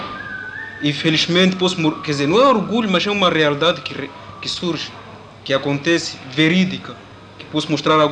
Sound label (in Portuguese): Cantar e Pintar; Mocambique